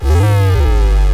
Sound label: bass